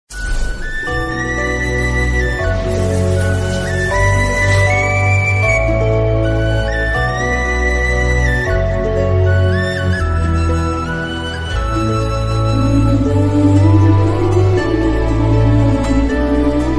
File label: flute bgm ringtone remix instrumental flute ringtone tamil